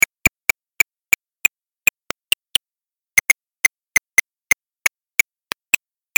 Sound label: Eastern Red bat calls